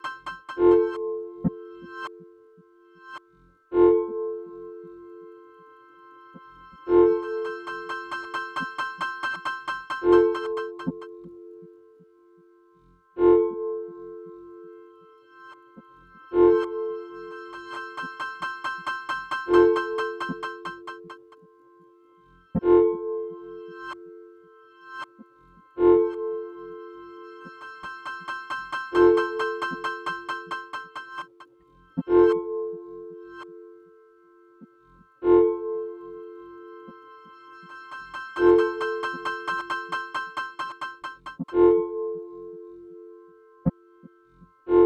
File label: granular